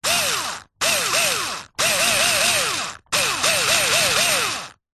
industrial power tool electric screwdriver several short bursts revs